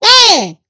Stand leg poke

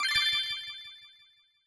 Crystal spawn; Super Mombo Quest

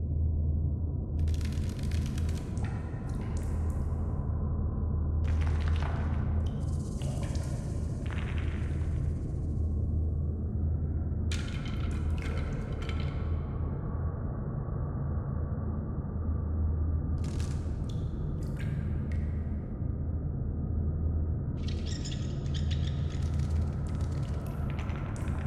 Ambience Cave Intro

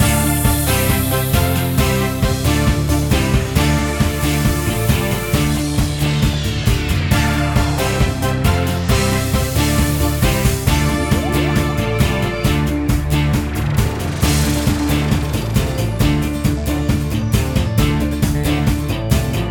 music; Zen Pinball Party; Garfield; minigame

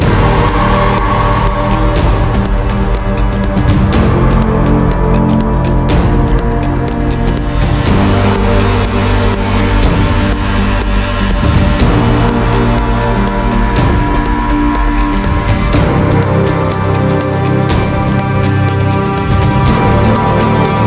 Combat Loop 4-4 122bpm; Combatpart3

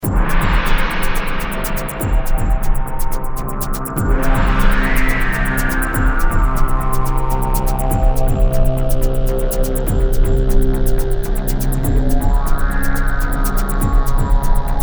Loop battle

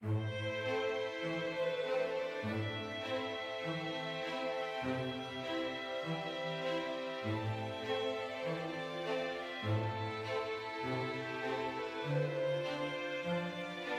adventure med

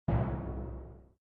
Split

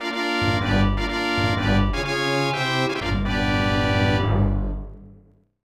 Stage Completed; super star wars; snes

